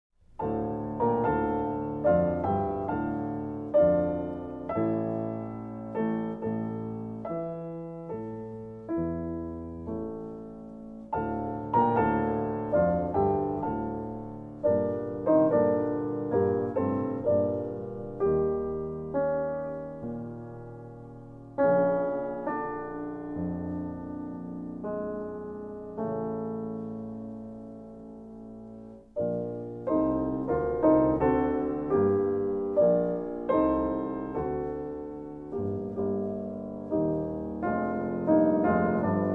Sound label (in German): Klaviermusik